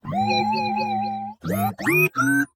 示警的嗡嗡声; 堡垒（守望先锋）

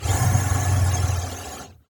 僵尸呻吟; 堡垒（守望先锋）; 普通语音

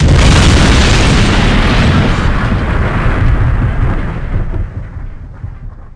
爆炸; 红色警戒2; 超级武器音效; 天气控制仪

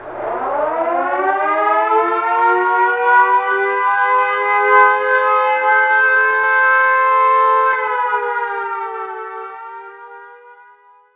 长警报声; 红色警戒2/超级武器音效; 核弹发射井

